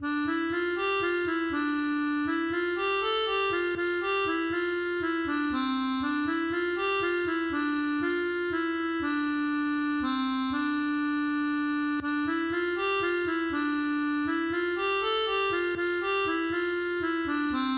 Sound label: tordion